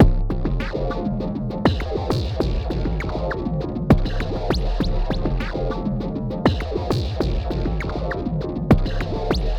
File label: Processed Beat, Drums & Percussion